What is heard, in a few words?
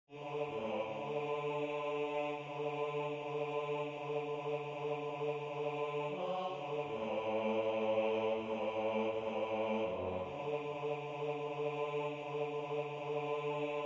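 Bass, Religious Folk Songs Of the Negro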